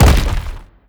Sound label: bash soft, brickwall, destruction